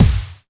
DM5 Kick, Alesis DM5 drum machine

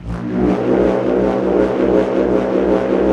SPIRIT CATCHER

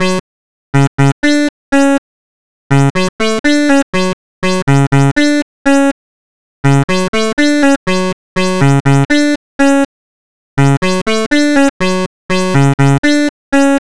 303 Lead; Sonic Foundry (Sony Creative Software); Club Joints and Street Anthems; Razor Edge

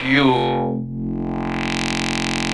VOC, Drum & Bass; Ueberschall; Drum & Bass Flash Trackz

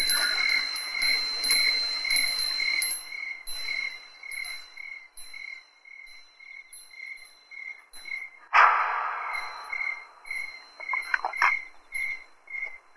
Enviornmental; Sonic Foundry (Sony Creative Software); Ugly Remnants VOL-2; UR 2 FX (Long)

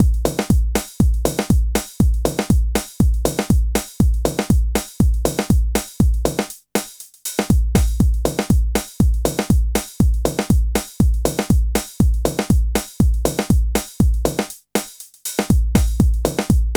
HOUSE; INIS; Funky Xtreams

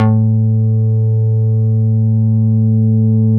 TUBE A2, BASSES

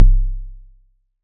SY TAKBAS; ModelCycles

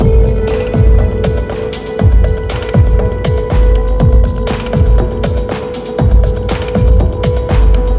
Ambi Groove

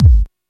RAVE

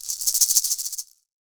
PUILI, HAWAII PERC; AKAI S6000 CD-ROM; WorldSounds

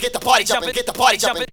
VOX SUNG; AMG Megabass REMIX! VOL-2

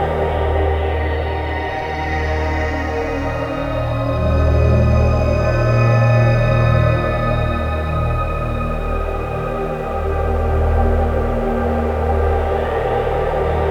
Synvoxperc Scape

